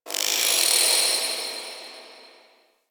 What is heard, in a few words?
Moon Clockwork Texture Small, Ambience; Warframe; Orokin